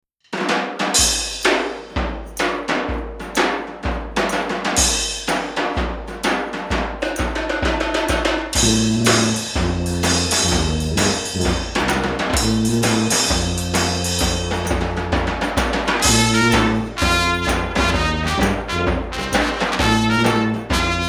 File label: Horn Spit